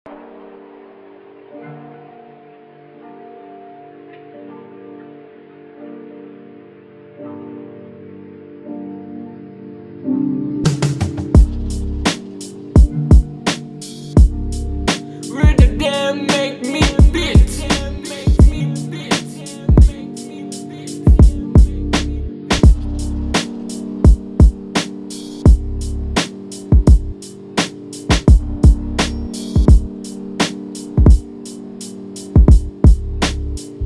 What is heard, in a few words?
boombap